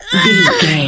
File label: voice female death